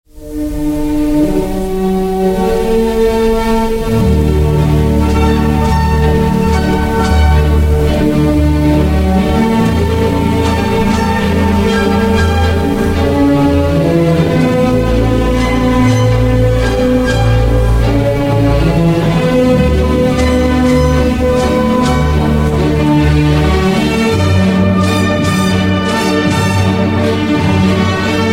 music

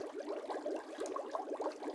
reel Spin; berry burst

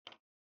type; zara

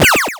enemy shoot; sky knight

